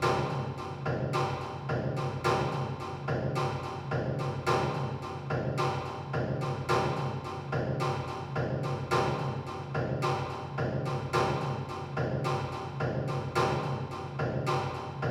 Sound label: Ship Main Klang; Far Cry